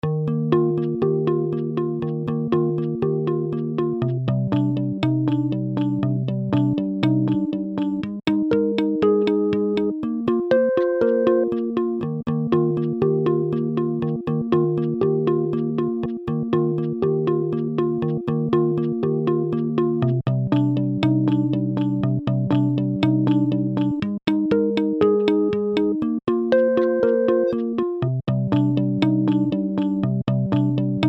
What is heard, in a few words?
harmonics